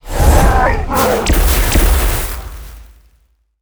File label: boss, monster